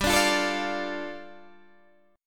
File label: G 7th Suspended 2nd G, Guitar Open D